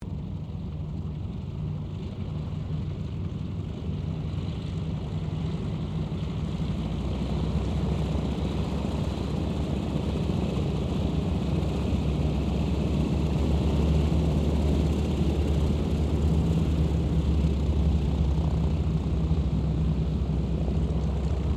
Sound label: AIRCRAFT CAC CA-25 WINJEEL SHUTDOWN, fighter trainer; RAAF Winjeel fighter trainer; Airplanes; Vehicles